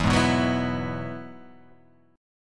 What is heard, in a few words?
E Major Add 9th